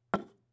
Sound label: Putting Down Glass Of Water